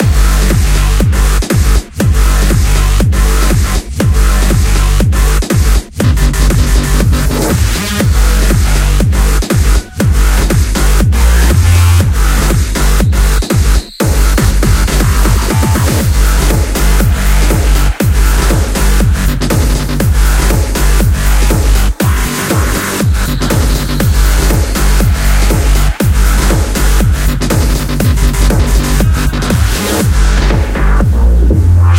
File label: menu loop; In Space We Brawl